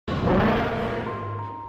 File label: growl; godzilla 2014